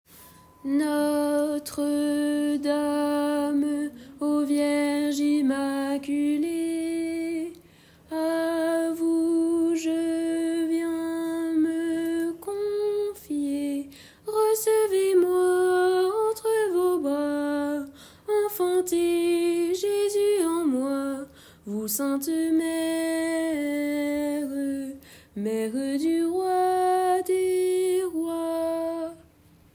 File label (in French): Chant du Jubile Refrain Alto; sanctuaire